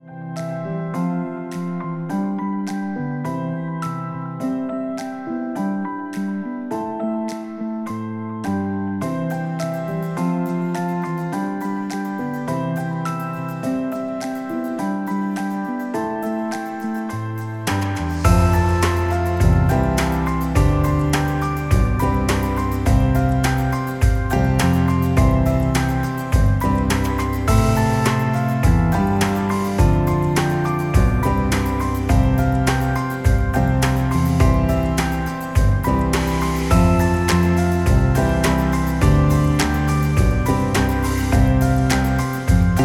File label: Daydreaming Casual; music for videogames